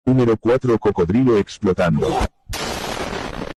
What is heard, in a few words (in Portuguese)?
crocodilo explotando